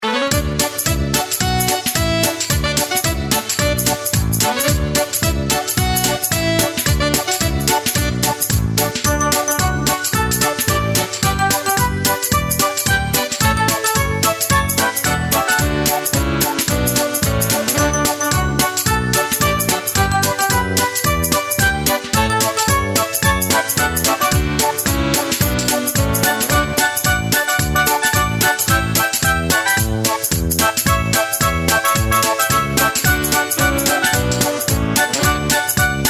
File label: free games music; Fluffy Favourites Mix 'n' Win